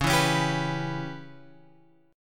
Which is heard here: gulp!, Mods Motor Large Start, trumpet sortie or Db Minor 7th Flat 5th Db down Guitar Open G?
Db Minor 7th Flat 5th Db down Guitar Open G